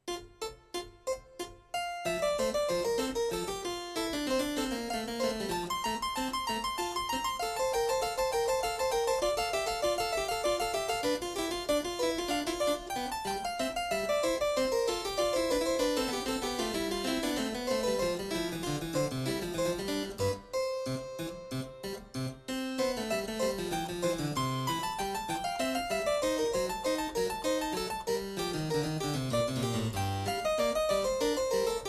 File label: harpsichord; Invention No 8